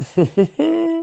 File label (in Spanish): jaja risa rara